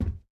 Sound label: step shoes, footstep